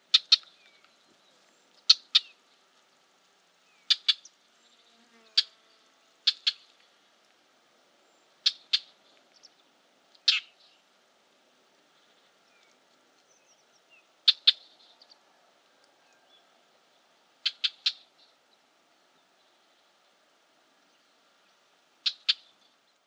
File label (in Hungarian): tövisszúró gébics hangja